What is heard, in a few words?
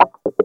WAH RIF, Funk Gtr & Wah; PlatinumPhattCD2; E-MU Producer Series Vol. 8 – Platinum Phatt